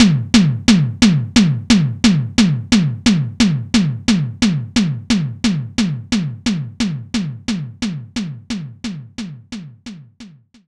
Electric Tom; knights try

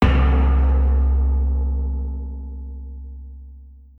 Fail; Idle Wizard

